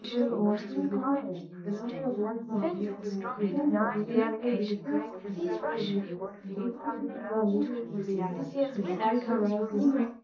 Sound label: female, 380ms 4cm; SiSEC11; underdetermined